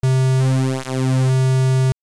pwm, synthesizer basics

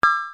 multi, vermona drm1

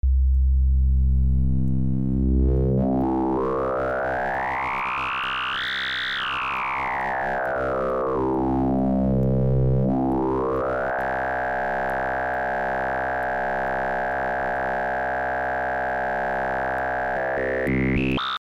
fm level, synthesizer basics

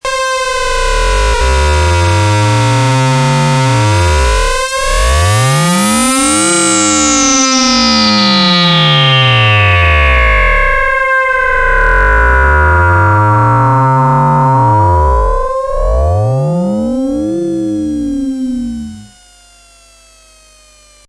RINGMOD; simplesynth; simplesizer5

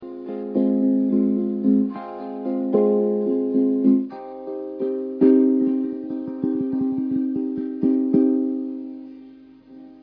vocon pluck

